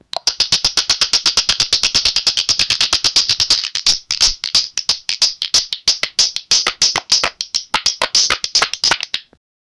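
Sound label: sausages beating each other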